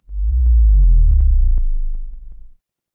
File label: transition impact hit